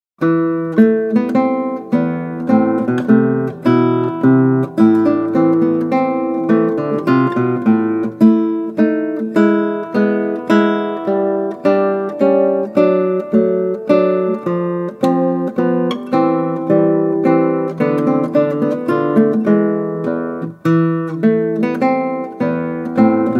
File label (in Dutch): Klassieke Gitaar; Bach 50 Solos